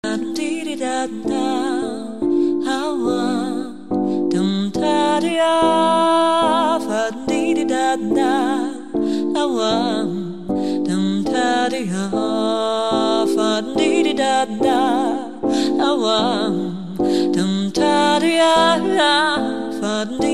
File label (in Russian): dzhazovaya raspevka iz dzhazovoj metodiki